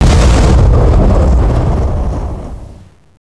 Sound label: Big, explosions; ambient; valve